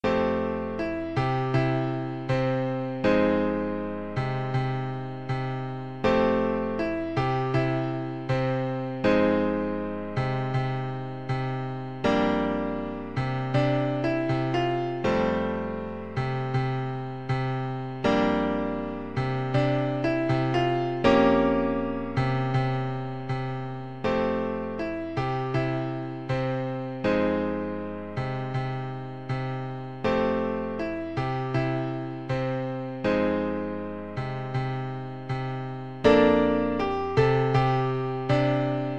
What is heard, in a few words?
romantic rumba, piano